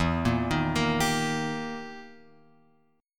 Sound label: E Minor Major 7th Flat 5th, E; EmM7b5 Chord {0 1 1 3 X 3} arpeggiated